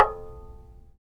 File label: TAR; Roland - LA Composer vol. 3